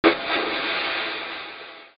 tv static

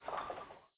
bowling alley fade out

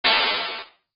tyre air

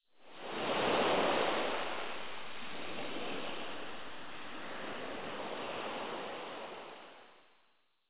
sea

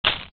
crush

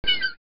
seagull

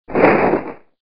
parachute open

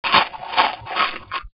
munch

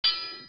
sword hit ring long

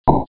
rubbing rock sound very short

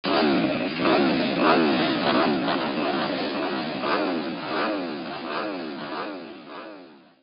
motorbike vrrm high pitch

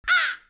crow

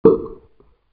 pop tube

